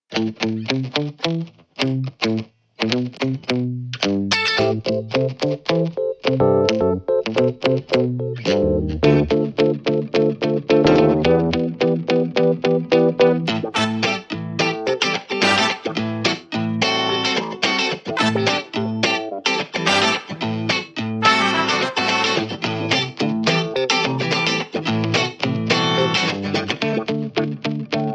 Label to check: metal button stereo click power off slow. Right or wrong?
Wrong